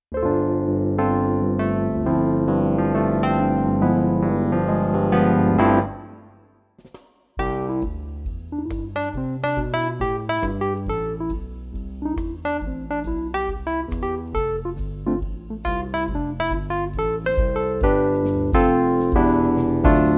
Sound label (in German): Pianist Duo Drums, Piano Drums Band; Hannover; Hochzeit; Empfang